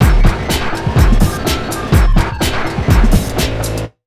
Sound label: BEA, Big Beat Drumloops; USB Soundscan vol.25; AKAI; NYC 125